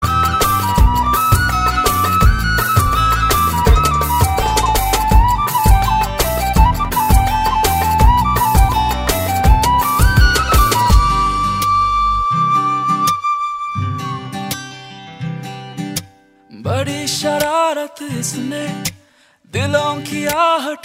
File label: Sweet Bansuri